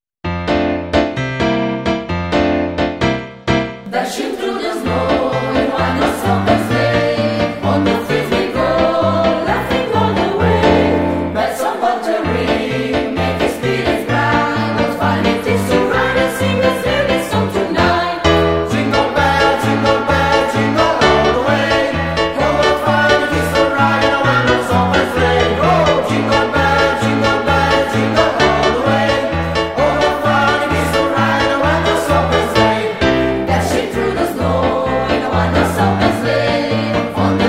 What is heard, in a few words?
jingle bells; CANTATA DI NATALE 2010